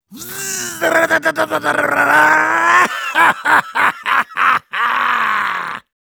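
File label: Heavy yell, German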